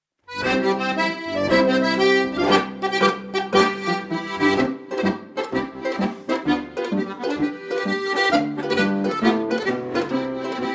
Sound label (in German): Marsch